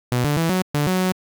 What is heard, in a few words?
level; TrustFall